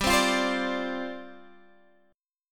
G 7th Suspended 4th G, Guitar Drop D; down

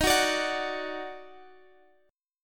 Eb Minor Major 7th Eb down Guitar Drop D, chord

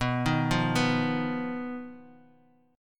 B Major Flat 5th B Bass Standard; BMb5; arpeggiated; 19,18,15,16

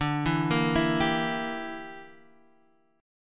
G Minor 6th D

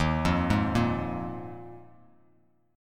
Eb Suspended 2nd Suspended 4th, Bass Standard; Ebsus2sus4 arpeggiated; JGuitar

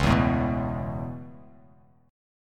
C sharp Minor 7th Sharp 5th C sharp Bass Standard; C#m7#5; strummed; JGuitar

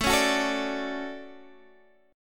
D Major 7th, chord

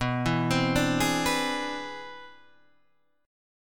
C Augmented Major 9th B

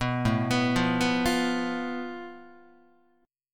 A Suspended 2nd Sharp 5th, Guitar; arpeggiated; JGuitar

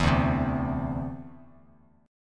D 7th Suspended 2nd down Bass Standard; D7sus2 strummed

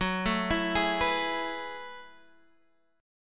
Gb Minor 11th Gb

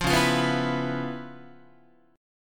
C sharp 7th Sharp 9th Guitar, chord

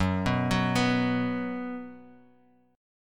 B 5th Gb, chord

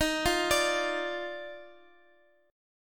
Eb Major 7th Suspended 2nd Eb, Guitar, Open F; arpeggiated; x,x,x,10,8,9; EbM7sus2

